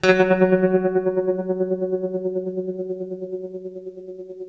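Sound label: tremolo F#2, guitar; East West; Steve Stevens Guitar Samples Collection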